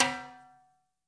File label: TIMBA; Spectrasonics; Liquid Grooves 1 & 2; BR.TIMBALES